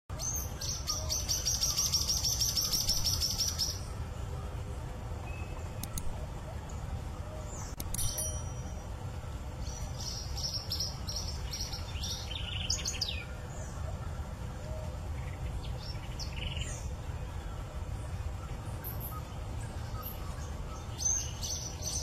Canto do Bentevizinho de penacho vermelho

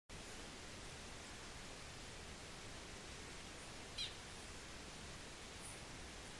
canto do tangara rajado

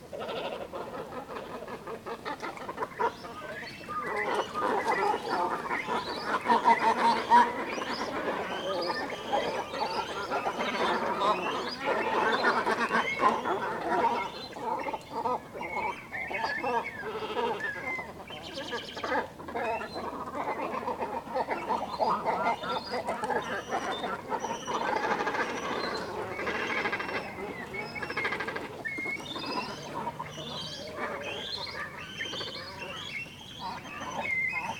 Canto do corvo marinho

